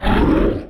Damage; Host; Mob

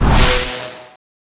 Gust Of Blast

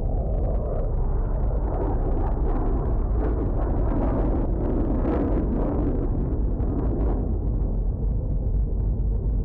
fly, ambience

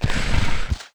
war walk, Mob; Elder

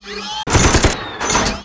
WAR ATTACK; Mob

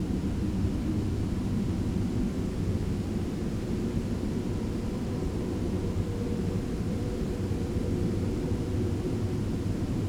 camp, ambience

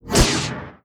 Beam Knife, Weapon

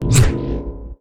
Lob Lava damage

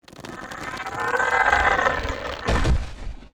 war die; GRAVELAPIS

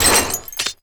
WAR DAMAGE; ARTHROP; Mob

